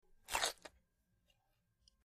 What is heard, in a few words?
Bite Carrot Juicy; punches kicks hits bones